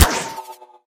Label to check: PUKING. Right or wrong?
Wrong